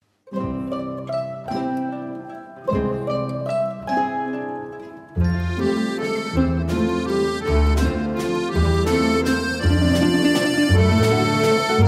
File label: musette, Zupforchester